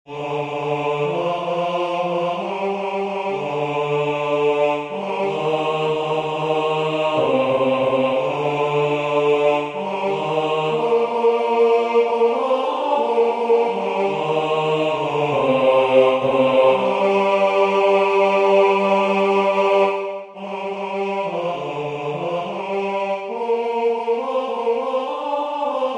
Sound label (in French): L'Esprit de Dieu repose sur moi basse